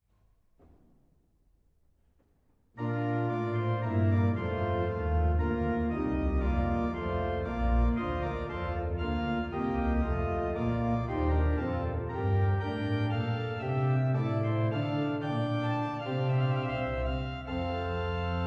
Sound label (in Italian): minuetto trascrizione per grande organo; opera rodrigo